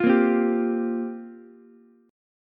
F Suspended 2nd Suspended 4th down Ukulele, Fsus2sus4 chord; strummed; JGuitar